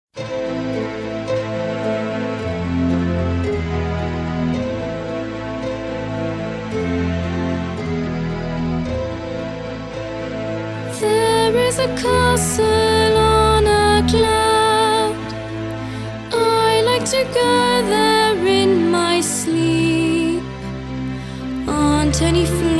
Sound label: Singing demo